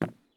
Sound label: walk wood; Escape from Tarkov